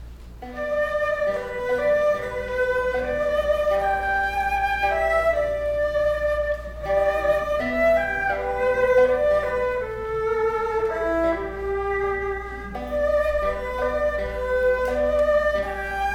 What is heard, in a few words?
trio folkmusic prelude